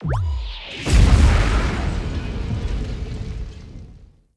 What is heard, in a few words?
Event Run; blue archive